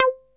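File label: electronic sequence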